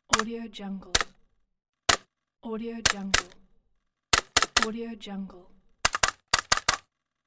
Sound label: stapling